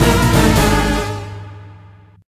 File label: incorrect jingle; HeavenStudioPlus; Quiz Show